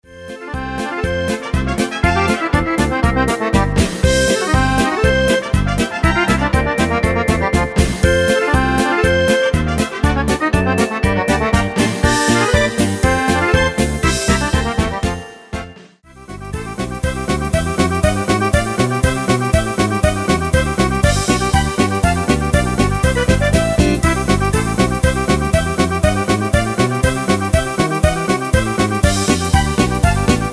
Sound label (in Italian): Fisarmonica Impazzita Polka Canto